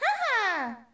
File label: haahaa; toadette; sm64coopdx; char-select-extra-chars